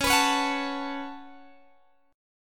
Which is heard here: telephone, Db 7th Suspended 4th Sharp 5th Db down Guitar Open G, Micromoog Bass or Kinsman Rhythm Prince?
Db 7th Suspended 4th Sharp 5th Db down Guitar Open G